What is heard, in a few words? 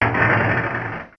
Folder Opened; ESAN VO Sound Schemes; ESAN Horror pack